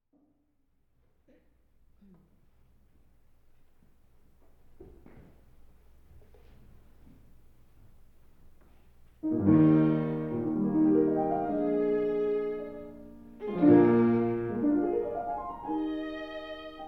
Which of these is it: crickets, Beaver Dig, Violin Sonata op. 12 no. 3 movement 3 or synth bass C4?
Violin Sonata op. 12 no. 3 movement 3